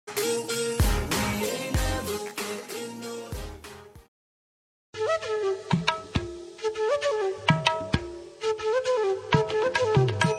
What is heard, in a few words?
flute best ringtone; noodles